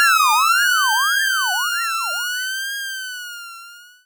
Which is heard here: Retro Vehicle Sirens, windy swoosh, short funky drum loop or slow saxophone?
Retro Vehicle Sirens